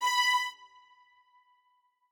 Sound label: strings